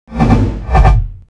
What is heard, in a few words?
blood bat magic